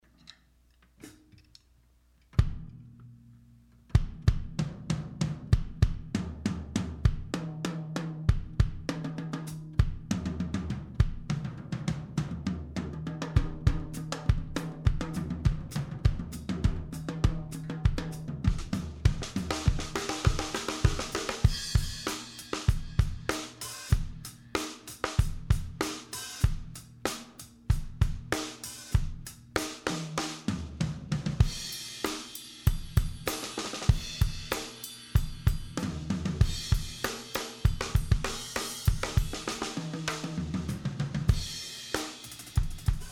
drum sound EQ COMP DRIER mixed; Audient

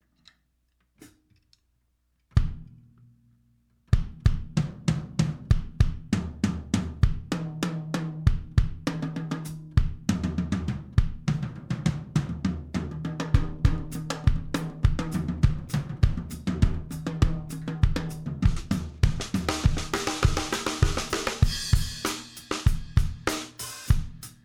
drum sound; EQ COMP mixed; Audient

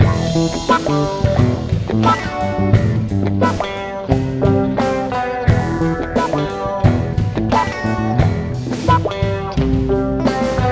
Wah Funk; Focusrite

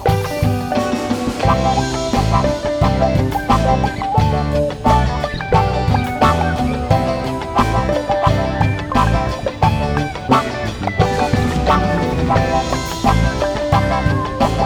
Wah Funk; Focusrite

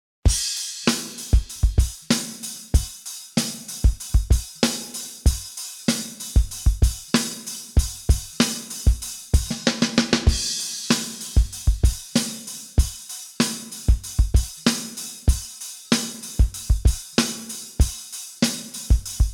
Frogs Drums

